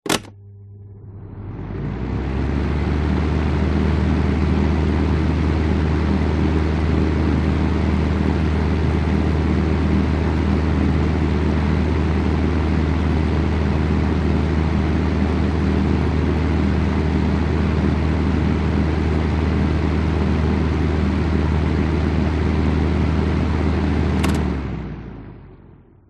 household heater fan small on run off behind heater; zapsplat